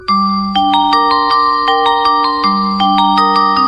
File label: Nice Sms Music